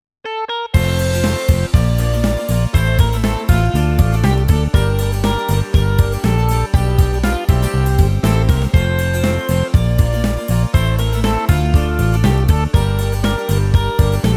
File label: Karaoke